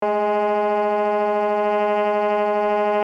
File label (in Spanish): Tremolos